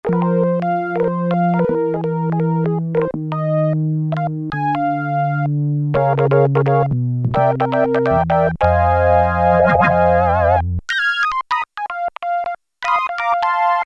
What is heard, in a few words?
Organ 2, Korg Poly-61M